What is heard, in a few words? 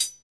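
hi hat; Best Service Gigapack 2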